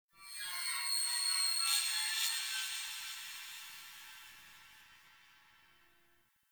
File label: Texture Interior Small, Ambience; Sentient Lair; Warframe